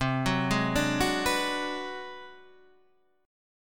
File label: G sharp 7th Sharp 9th Flat 5th